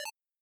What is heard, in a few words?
square pico